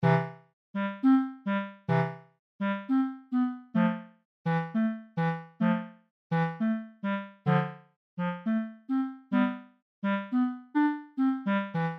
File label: idle theme; code game jam drop plafond 2025